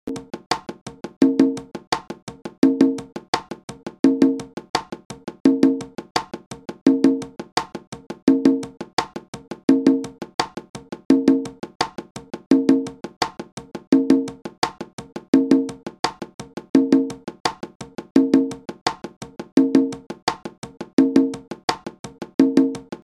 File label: conga